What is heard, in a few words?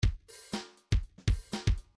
grooves funk